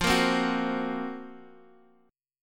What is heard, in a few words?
F sharp Major 7th Flat 5th F